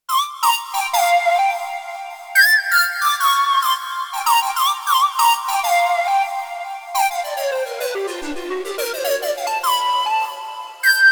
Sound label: Synth Pipe Solo, Roland JD-800; Factory Patches